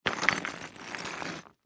Rock Movement Small, Foley Rock Movement Small Pile Slide Concrete Debris; Materials